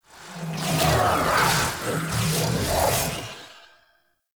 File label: Moon Intro Sentient Fly By; The New War; Cinematics